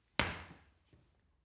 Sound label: punching bag kick soft